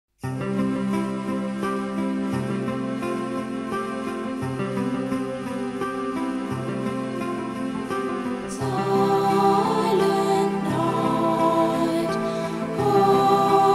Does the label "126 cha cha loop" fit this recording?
No